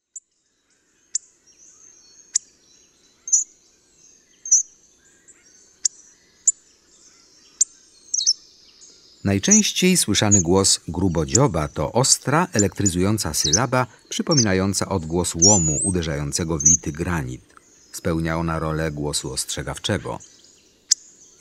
Grubodziób, Ptaki; Kurs PTTK

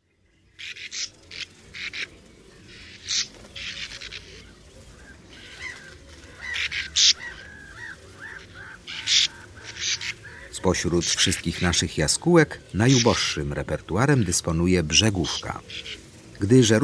Brzegówka, Ptaki; Kurs PTTK

